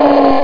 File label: drag hit